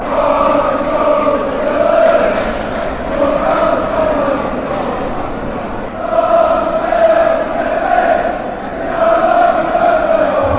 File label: genk chant